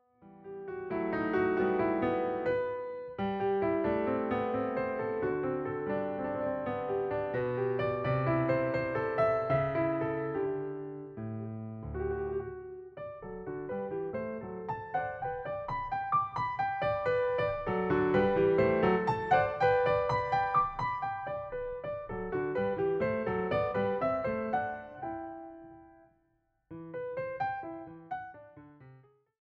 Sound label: Keyboard Sonata in E Major, Andante; Keyboard Sonata No. 37; Hob. XVI, 22; haydn; Piano Sonatas 35-38